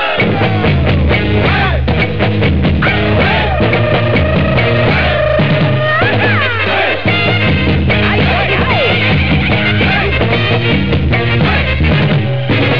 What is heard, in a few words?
conga